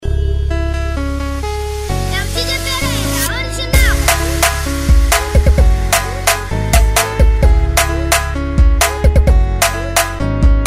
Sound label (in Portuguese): especial funk